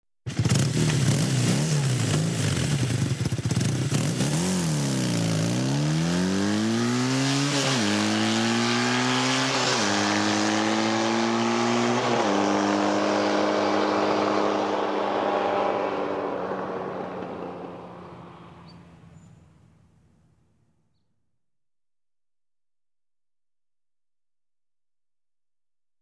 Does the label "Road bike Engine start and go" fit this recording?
Yes